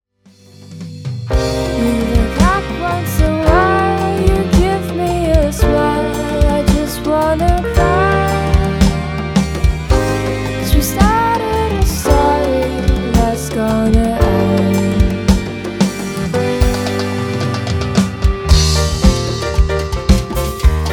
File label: Summer Salsa